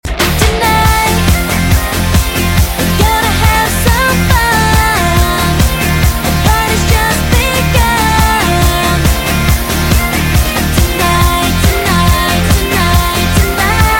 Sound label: Is Calling RNB